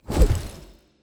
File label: unit swap; player